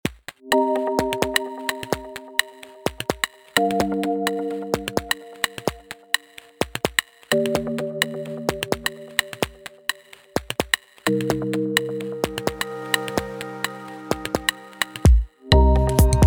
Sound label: modern chillout